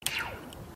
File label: switch